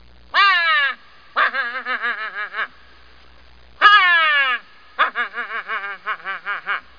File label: pronghorn